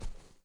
Footstep Grass

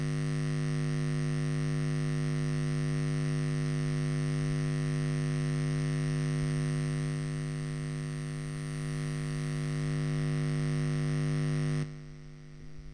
Electric Buzz

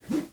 Swoosh

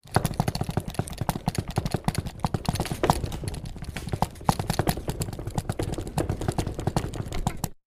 Car Squeak Chair Vibrating Upclose

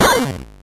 8bit Hit

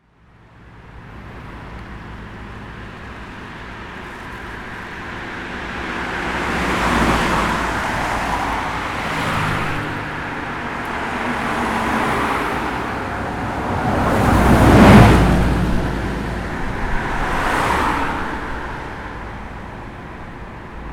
Traffic Cars Passing Left To Right Nearby

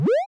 Jump

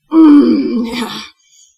Mmm Growl

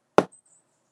Wooden Tap

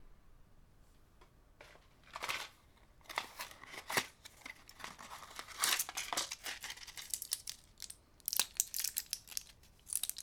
Taking Pills

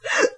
No Air

